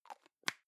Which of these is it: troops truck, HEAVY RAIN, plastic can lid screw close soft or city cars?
plastic can lid screw close soft